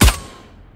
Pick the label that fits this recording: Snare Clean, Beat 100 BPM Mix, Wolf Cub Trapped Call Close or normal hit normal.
normal hit normal